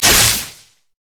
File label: lightning rush; LoL; kennen